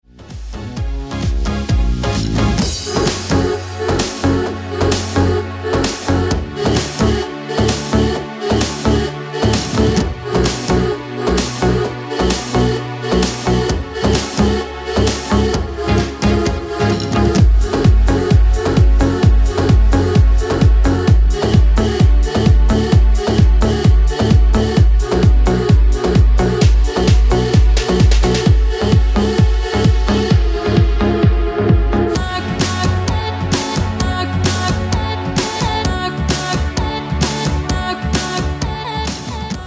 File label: dance music